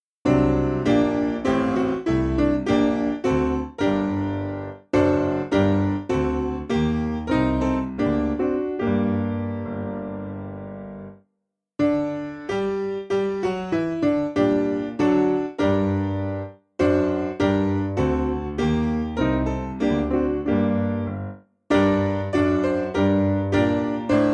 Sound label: Piano; God Has Spoken; Arfon